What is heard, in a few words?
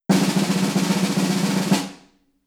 Drum Roll; Pokémon The Unreleased Tracks; 3DS DS GBA Switch